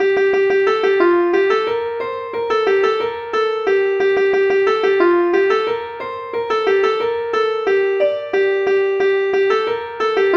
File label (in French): suite ronds